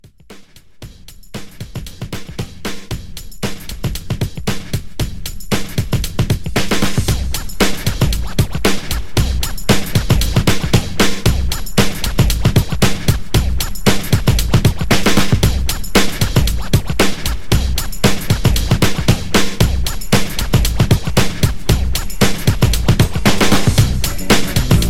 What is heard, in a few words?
90s Old School Re Drum